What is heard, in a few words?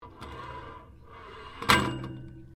MECHANICAL PULLEY METAL METAL CLANK; Variou smechanical sounds; Clanks (Sound Effects:Objects)